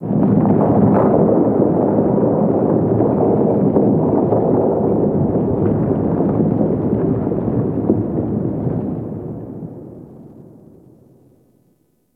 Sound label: Ice Distant Ice Break Large; Warframe; Corpus Ice Planet; Ambience